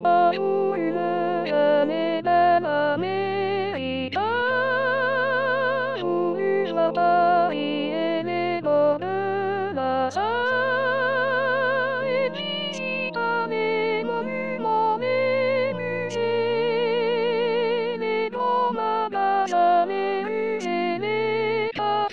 soprano

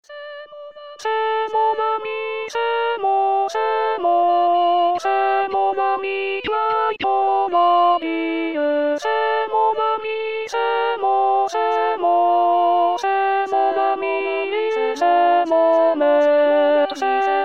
alto; c'est mon amy; 2016 st jean

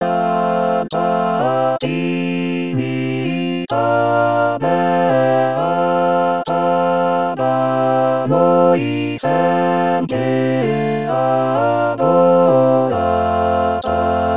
tutti; baroque

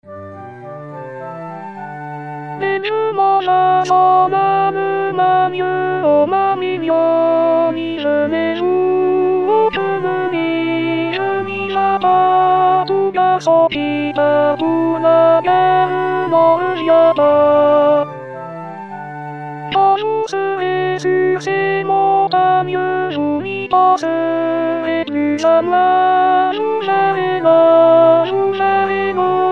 alto; belle je m'en vas; 2026 saint jean

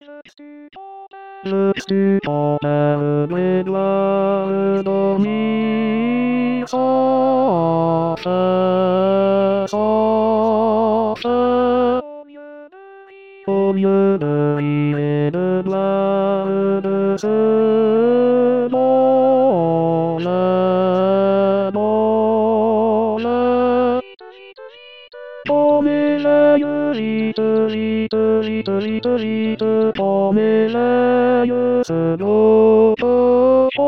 tenor

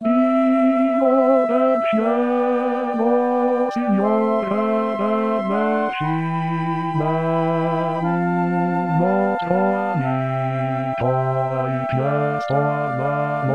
signore basse; 2023 st jean

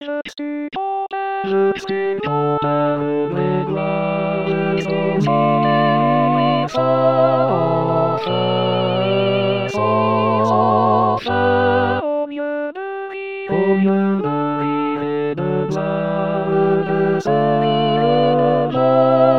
tutti

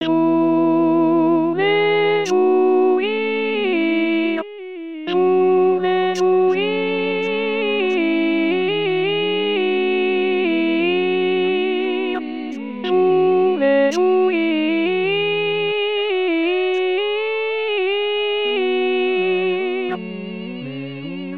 alto

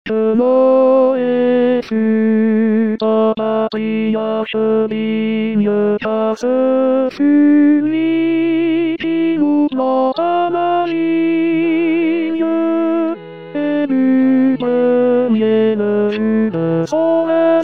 tenor

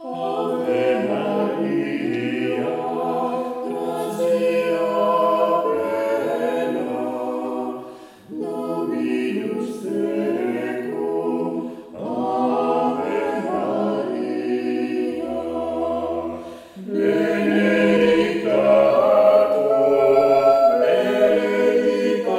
ave maria arcadelt

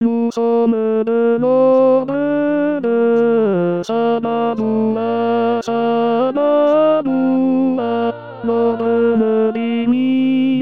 tenor; saint babouyn

